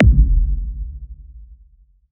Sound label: football kick sound, field